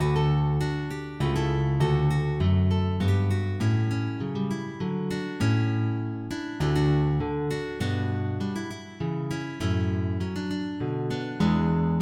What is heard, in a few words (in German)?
Sarabande D dur